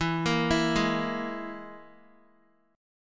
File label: E Major Flat 5th, Guitar, chord; arpeggiated; JGuitar